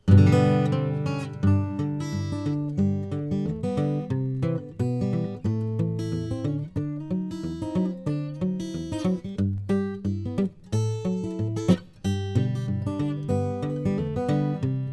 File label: Model J Cocobolo, guitar; James E Wood